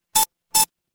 ui beep

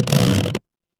cartoon stretch

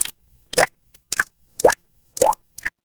water drip

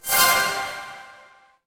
magic scifi spell

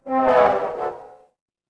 feedback fail

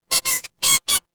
cartoon squeak

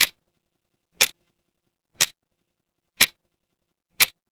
mechanical clock tick

